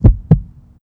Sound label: heartbeat fastish